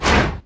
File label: basicattack human; LoL; shyvana